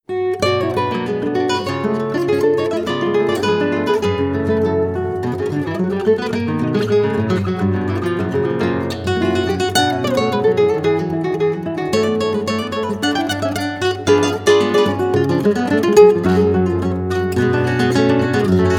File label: fantasia for two guitars